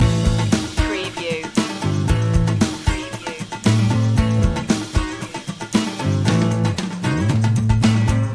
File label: country road chase loop